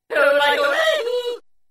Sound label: Yodel